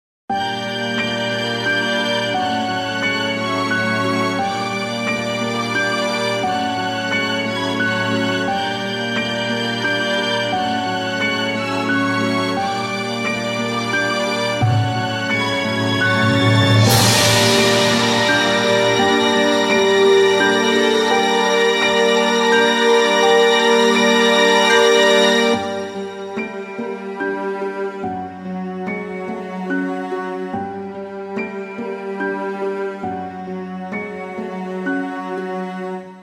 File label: orchestra; maoudamashii